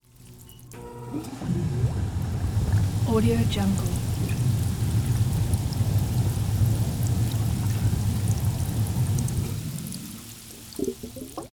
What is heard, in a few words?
hot tub blower